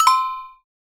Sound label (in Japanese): pinpon